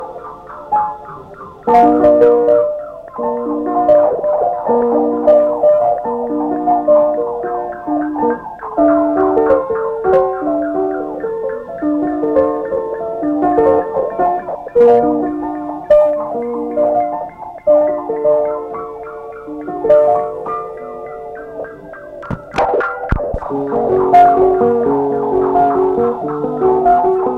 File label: piano